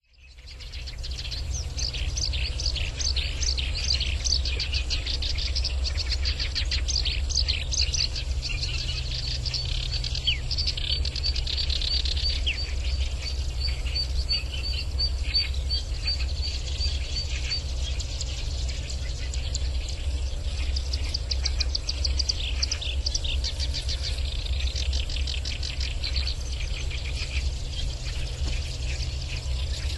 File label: black browed reed warbler